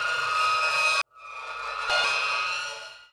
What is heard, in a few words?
Stereo TEST mini